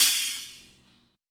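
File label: Aircrafts MiG-29 Canopy Canopy Stop Cockpit; DCS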